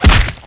Bat; Wild West Shootout (Arcade) (gamerip) (2019)

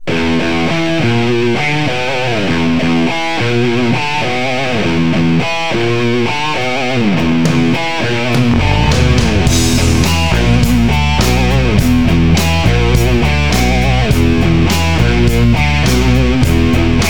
Rock; Beavis and Butt-head Do Hollywood; PS1

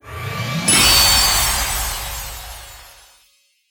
Function Release

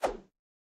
Swing Small

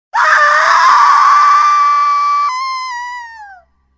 woman scream

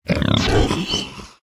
Death Smoke; Aporkalypse Pigs of Doom

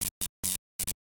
Electric Particles; Caffiend

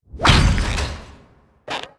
Sfx Move

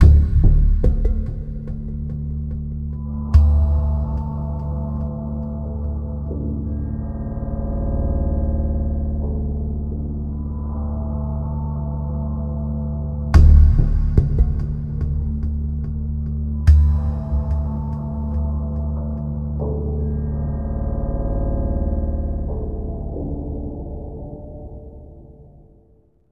Amb Indoor; The Hobbit; PS2

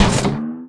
Tarp Jump; subway surfers city sound effects; Android, iOS

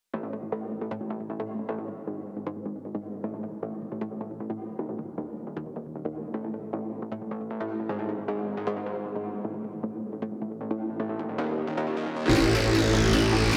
Basses 154.675

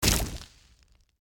Death Burst Small

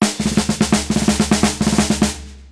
Jingle Drumroll Basic

